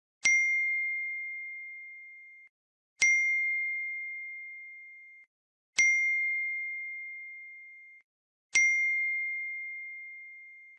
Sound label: Iphone 14 Message 3 Times Tone Ringtone